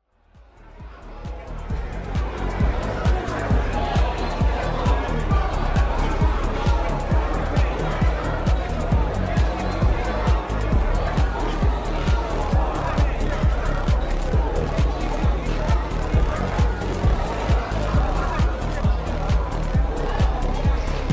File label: Party Ambience